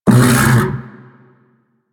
Undazoa Vocal Tranq Grunt, Hunting Critters; Warframe

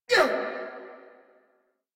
Pain; Grineer Queens Guards; Warframe

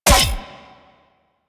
Full Draw Mech; Warframe; Infested; Derelict Cernos Prime

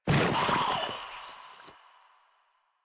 Infested Ancient Attack; Warframe; Ancient Ninety Nine; Proto Infested Ancient

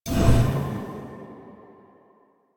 Go Board Begin; Warframe; Quests; Sacrifice

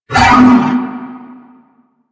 Horse Vocal Grunt Breath Short; Vocals; Warframe; Vehicles; Duviri

